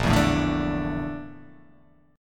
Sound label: Bb Major 7th Flat 5th Bb; BbM7b5 strummed; JGuitar